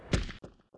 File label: dist gun